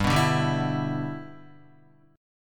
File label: G 6th, Guitar; G6 strummed